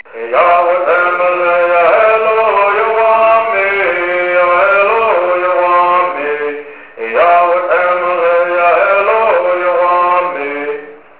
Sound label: Bird